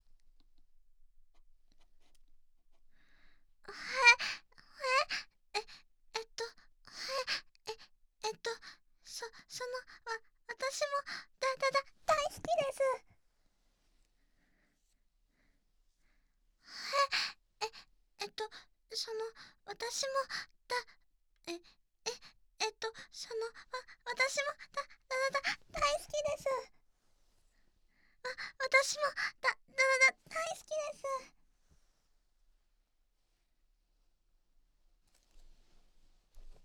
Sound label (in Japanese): 双子耳舐め&手コキ; 双子ボイドラ編集前